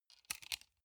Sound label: sunglasses plastic grab soft